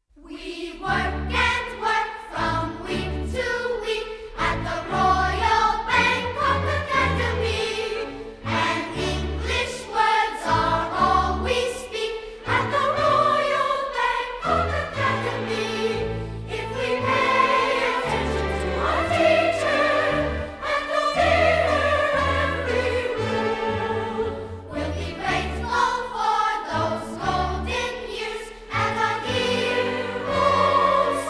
Childrens Chorus; The Royal Bangkok Academy; 1977 Broadway Revival Cast - The King and I

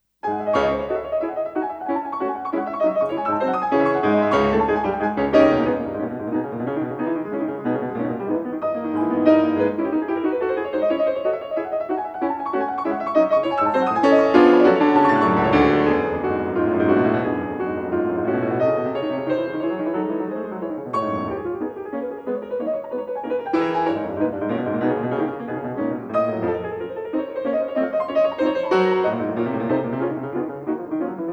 Etude in C sharp minor - Presto con fuoco; Chopin; DG

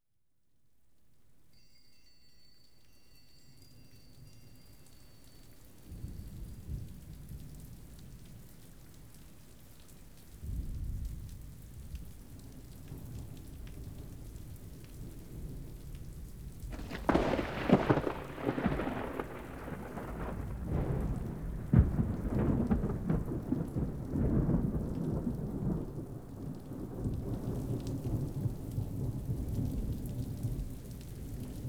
Thunder in the Rain; Dolby Surround encoded; Denon Jazz Sampler Plus The Audio Tool Box II

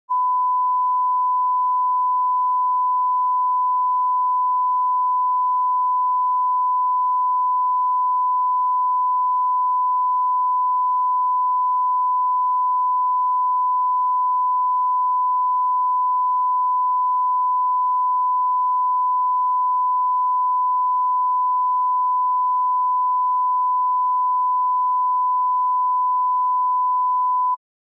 1001 Hz Sine Wave, -16 dB, Reference Level; Denon Jazz Sampler Plus The Audio Tool Box II

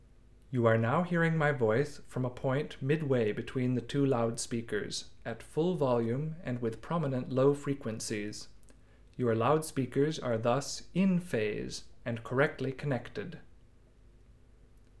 Test Signal

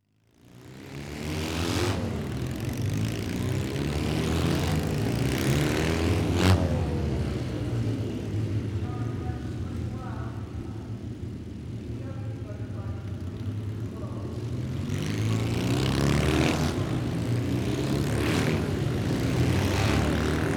Motor Cycle; Display Team; The Royal Signal White Helmets

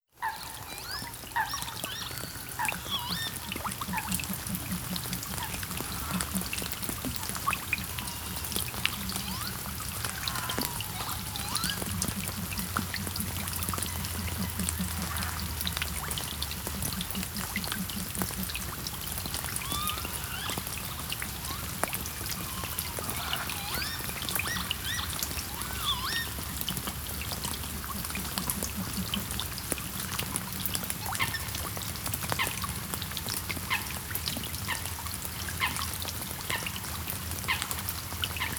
Lapwings (Vanellus Vanellus) and Rain; EXTREME SOUND 2

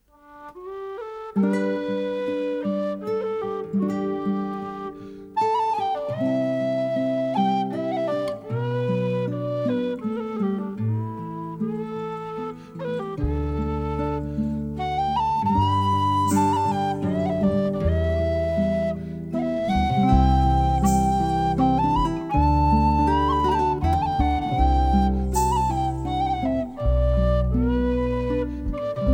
Instrumental; Eleanor Plunkett

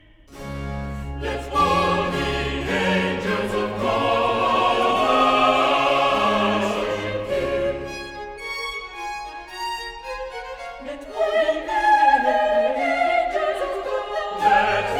Chorus; Let all the angels; 亨德尔：弥赛亚; John Butt

